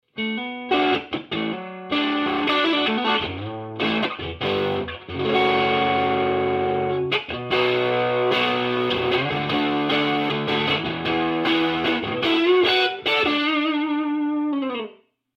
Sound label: overdriven